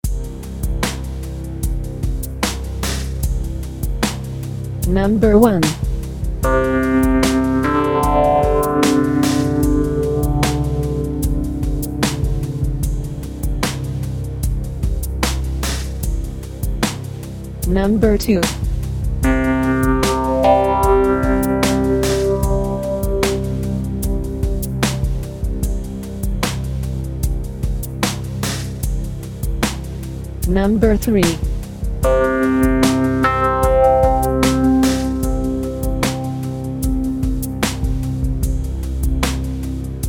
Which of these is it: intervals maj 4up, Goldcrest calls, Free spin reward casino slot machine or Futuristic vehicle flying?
intervals maj 4up